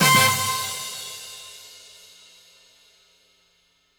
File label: Disco Stinger Stab